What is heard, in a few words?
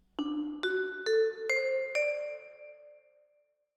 Vibra Close, Jazz for piano